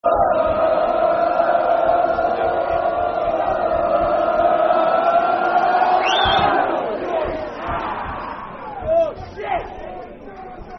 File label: goal kick football chant (soccer song); Brentford